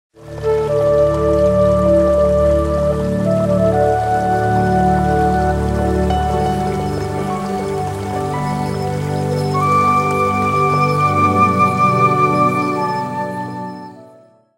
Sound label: Forest Lake, Nokia Tones